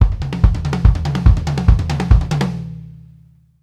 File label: TWO TONE R, Drumworks; Sampleheads; New York City Drumworks VOL-1